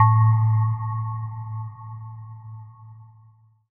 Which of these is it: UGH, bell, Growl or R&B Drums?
bell